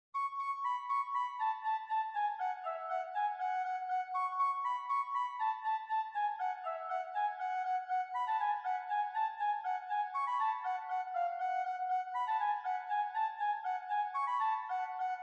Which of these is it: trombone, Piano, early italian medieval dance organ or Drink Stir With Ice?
early italian medieval dance organ